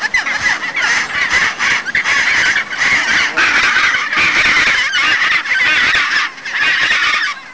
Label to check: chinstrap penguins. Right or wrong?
Right